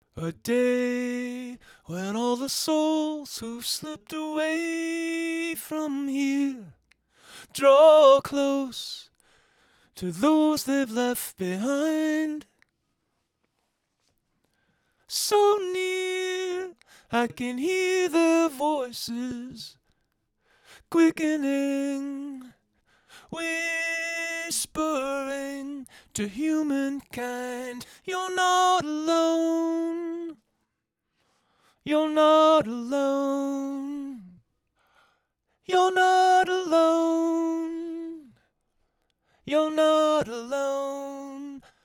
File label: pitch up 1 fifth; retune